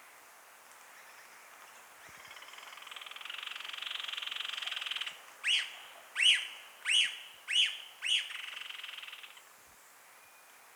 Cichlocolaptes leucophrus leucophrus song after play-back; Ricardo Parrini